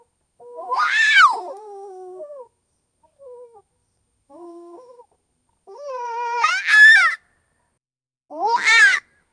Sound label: horse baby loud voice